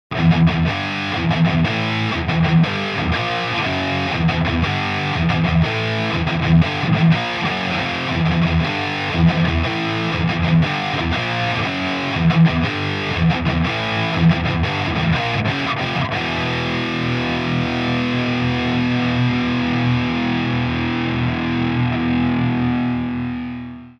vh4 no bbe treb

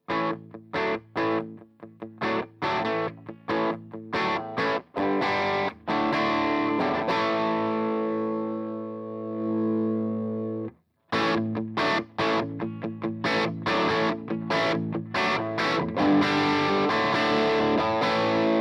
schmidt test

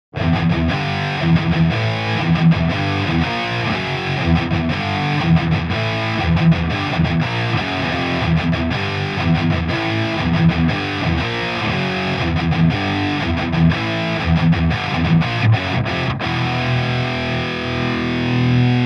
vh4 treb 2

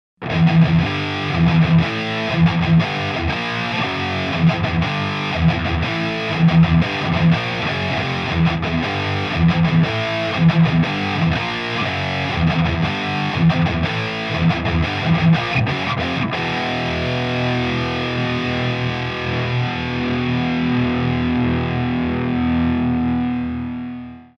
vh4 no bbe

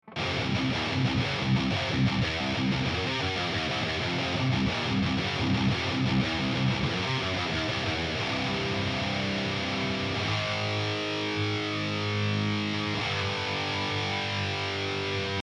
amp; huge amp shootout

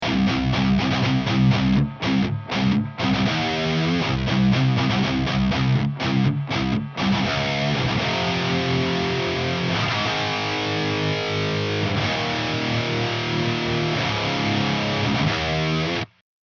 amp no back; peters mako mesa krank splawn

